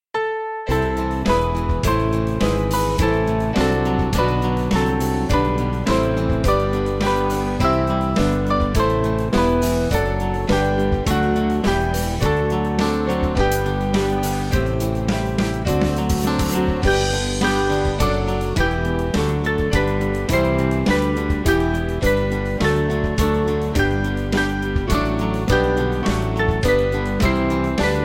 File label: He Who Would Valiant, Band; Dunstans